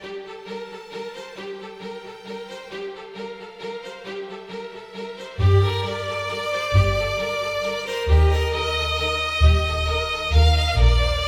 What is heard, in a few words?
Music Recovery Bowed; 7 Mages